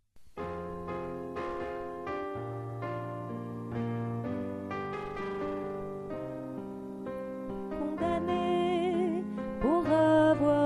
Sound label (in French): ALTO